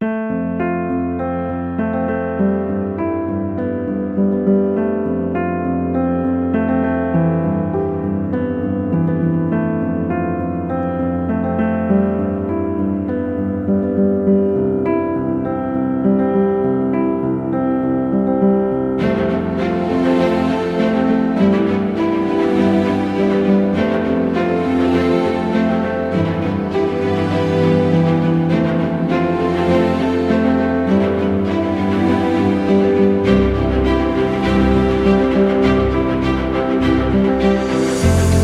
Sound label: Documentary; dramatic